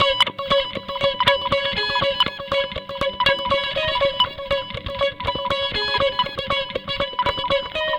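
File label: Guitars 120 C#; Zero-G Kocktail Kollection; Adrennalinn 2fers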